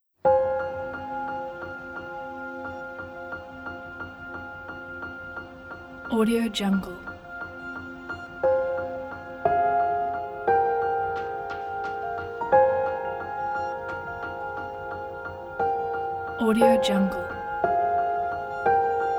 inspiring documentary

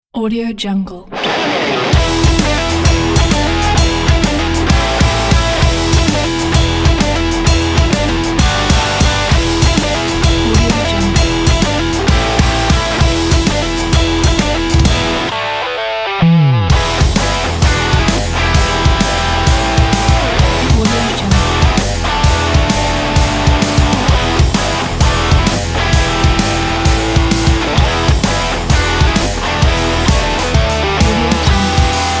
steel and car tuning metal rock

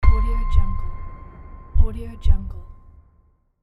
dramatic heartbeat

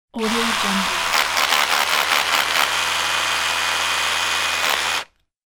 driller making hole in brickwall

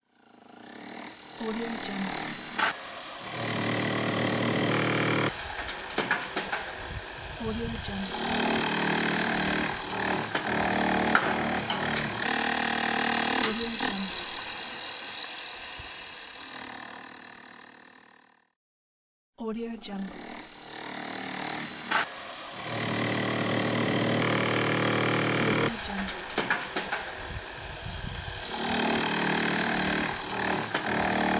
construction work with hilti

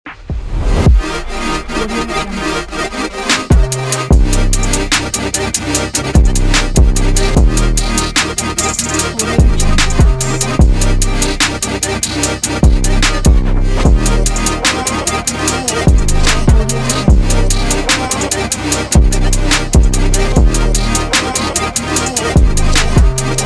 future bass

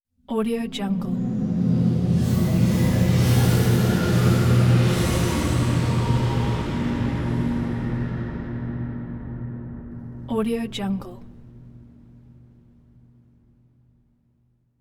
airy deep celestial ambience

